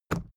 briefcase plastic put down; industrie wirtschaft; bueroarbeit

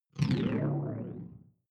sci-fi alien big bionics down; swooshes-swishes

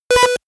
computer sound, signale; soundpool

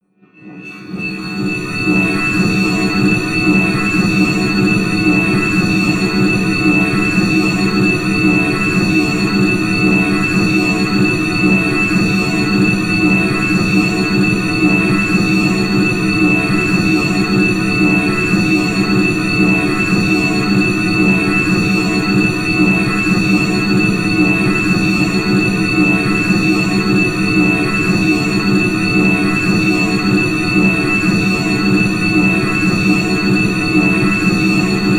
drone industry hallow high

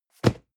cardboard box medium place hard; objekte

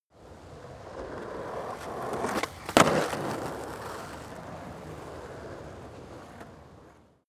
skateboard single drive by jump small

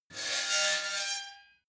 metal squeal bright fast

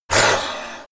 yeti hiss; kreaturen

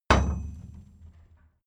metal tool drop massive; berufe; handwerker; soundpool

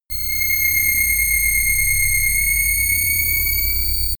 intensity raising beams, laser; krieg-waffen; soundpool

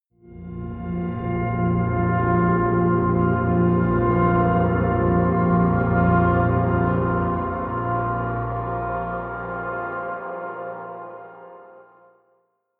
atmospheric drone pad; musikelemente; drones

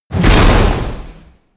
wham, whooshes

